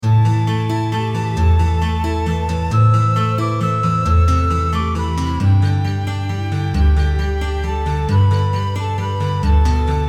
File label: mist covered mountains, Looping folk song remake; bo jingles